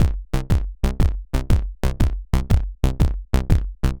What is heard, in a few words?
step sequencer swing 67